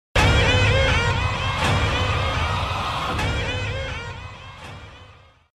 creepy sound; pennywise

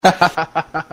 hahahaha

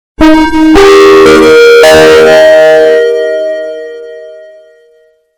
loudest sound ever

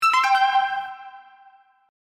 notification; gta v

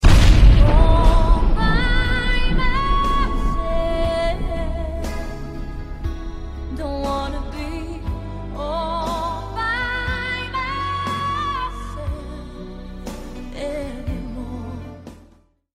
death sound

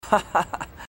ha ha ha; wiseau

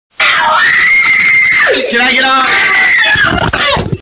fat kid meme scream

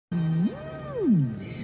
mmmmmmm; skeksis

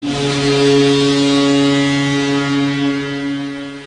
goal horn; boston bruins

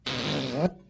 ripping ass fart

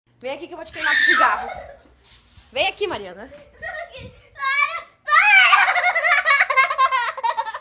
cry

